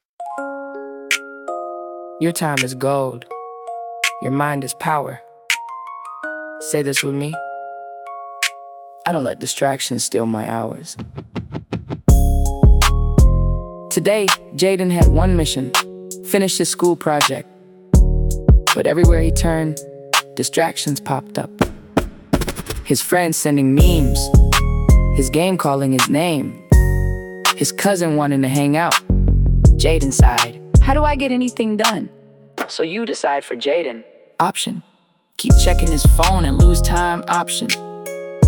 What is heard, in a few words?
pop up song; distractions